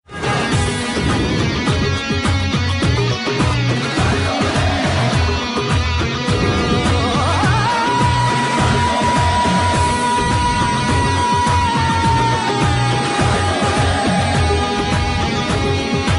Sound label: Dum Dum Synth